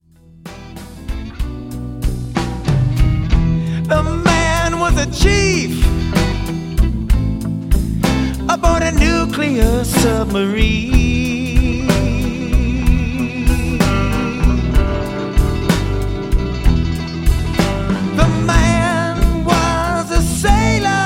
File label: Ballad